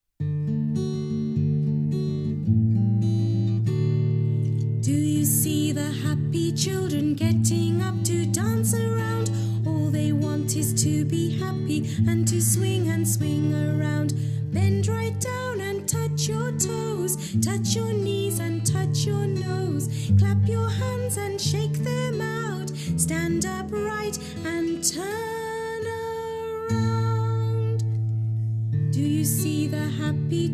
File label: english songs for kids